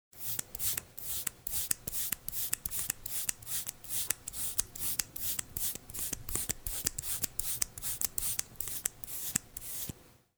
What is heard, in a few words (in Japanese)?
ohuro